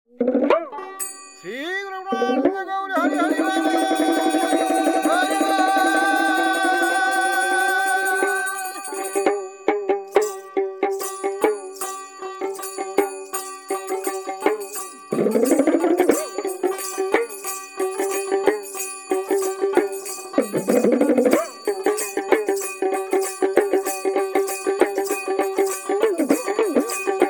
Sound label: baul chant hari